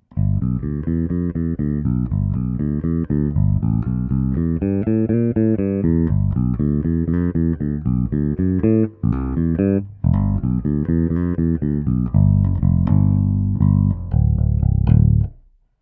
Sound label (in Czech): H-H prsty, nerezová ocel, Flatwoundy, Schecter SLS Elite-5 baskytara; D'Addario Chromes ECB81-5SL, 45 - 132